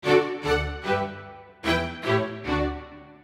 Orchestral message

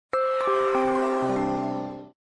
Power Off, phonetones system; KV500 Cookie

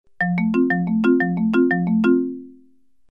message, notifications; Philips S326

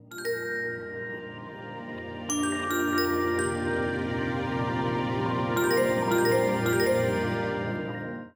system ringtones abstract; Amazon Fire Phone

